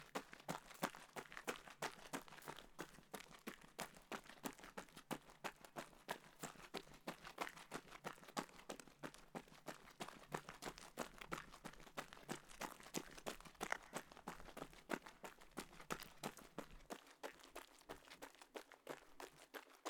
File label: Morning Jog